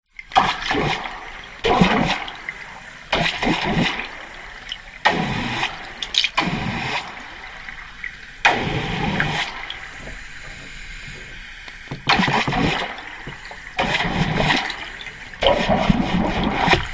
nature water spray short bursts from hose deep water underwater hydrophone; zapsplat